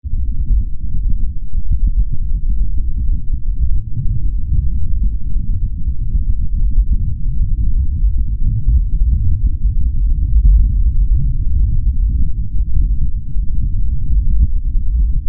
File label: Wind Sounds on Mars